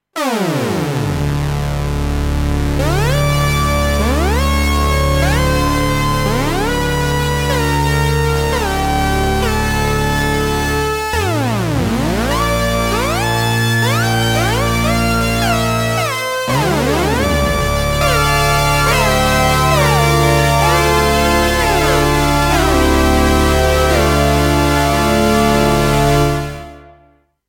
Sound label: Lead Pitch Env